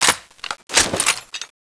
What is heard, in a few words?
mk46 cover down, weapons